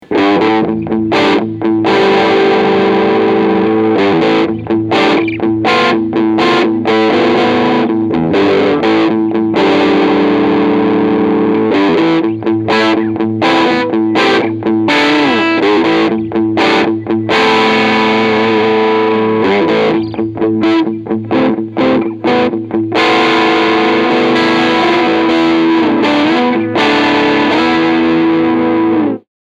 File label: Medium Gain